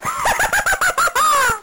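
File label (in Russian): смех женский зловещий радостный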